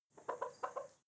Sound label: hens clucking short; natur-tiere; huehner